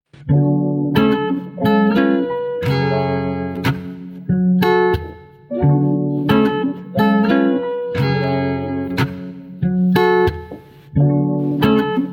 california instrumental

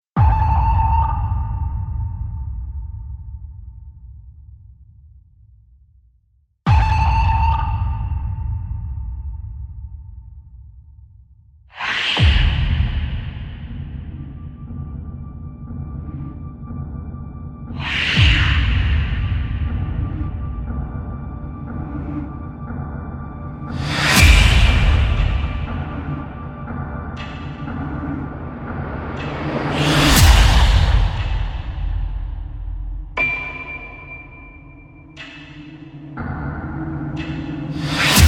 hybrid core music sound epic intense horror music

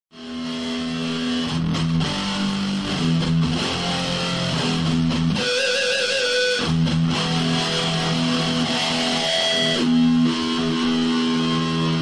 pentatonic

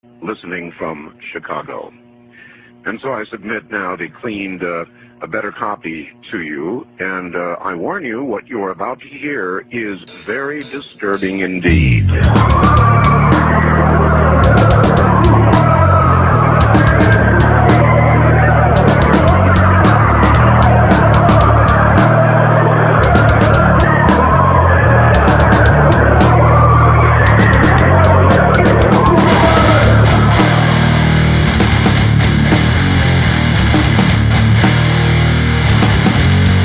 death metal

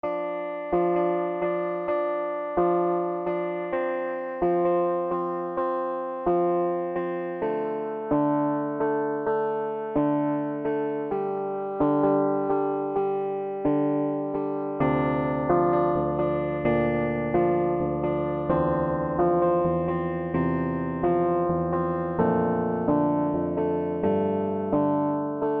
Rainfall

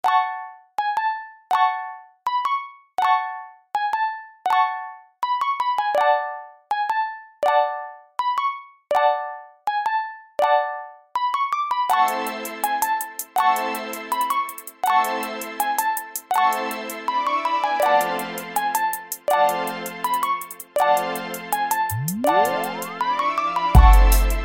instrumental

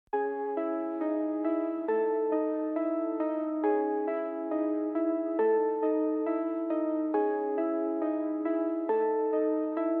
hard dark smoke type beat

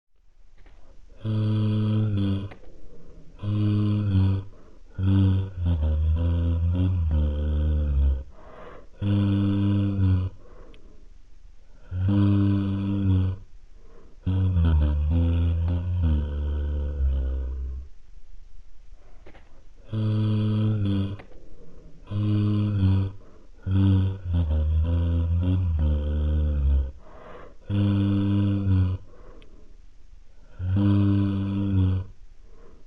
creepy man singing lalala

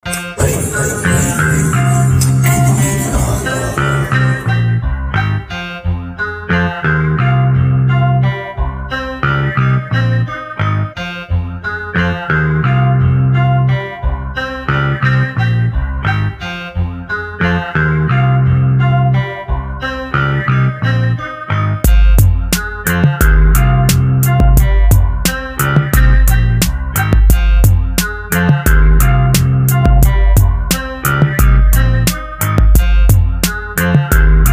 boombap

